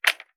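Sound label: gear rattle weapon medium; viper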